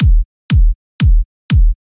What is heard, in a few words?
kick a